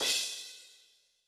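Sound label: Fast Crash, Cymbal; Yamaha DTXpress-II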